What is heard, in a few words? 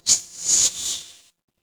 magic scifi shield